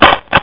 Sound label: get ammo